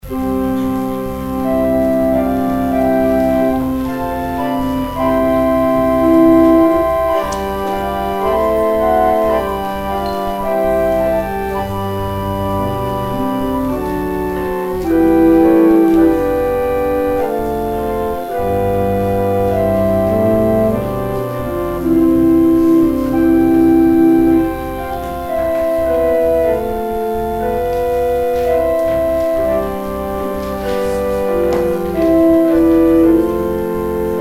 organ interlude

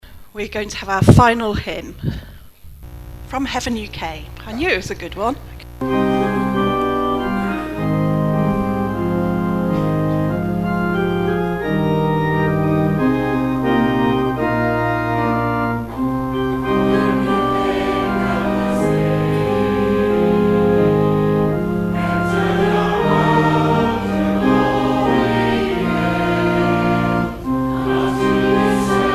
hymn; maundy

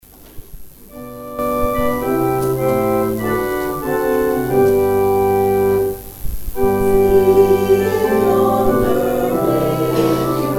introit see in yonder manger low; watchnight; midnight; choir singing an introit 'See in yonder manger low'

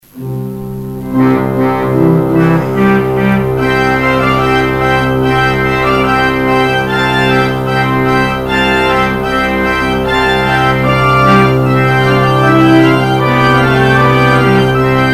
organ outro; Organ exit music (All'Offertorio - D. Zipoli; St. Mungo's

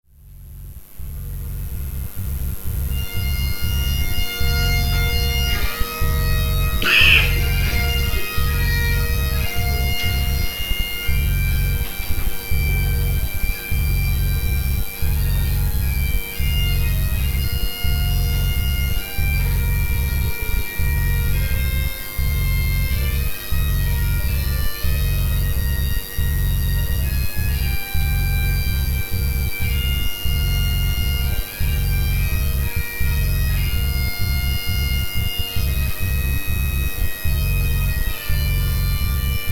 lament; St. Mungo's

